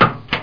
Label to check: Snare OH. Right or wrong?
Wrong